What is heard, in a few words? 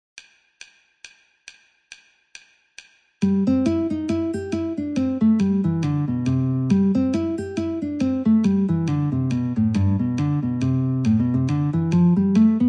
Guitar metronome 69